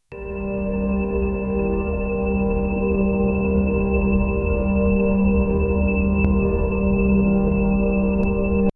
ambient room tone night, reverberant

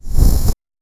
flame forming creating; fire

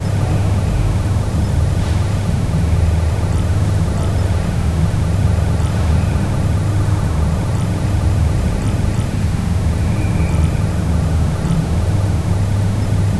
palace hallway ambience

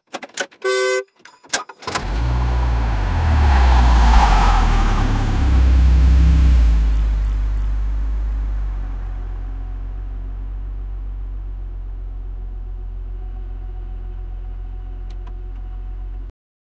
car start sound cars; automobile

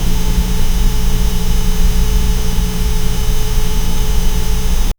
low steady hum of, apartment

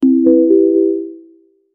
popup notification; children's

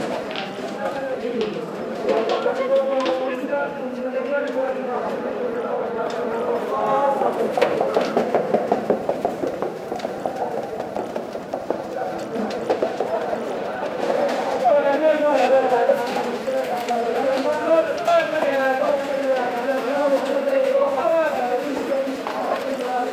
ambience of middle easter, market

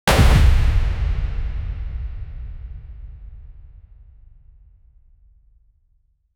sharp collision impact

